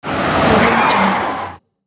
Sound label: car brake